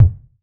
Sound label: BD, BASS DRUMS; EdgeSounds